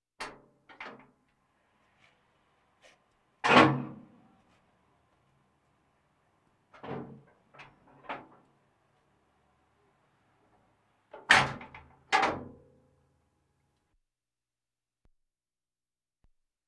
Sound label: Military vehicles